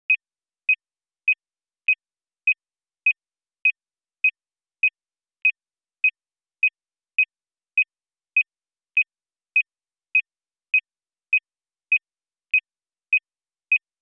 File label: Car interior beep signal notification